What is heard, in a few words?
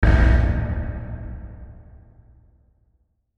Hit Drum Low; Hit Drum Low Powerful; Blastwave